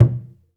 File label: African Perc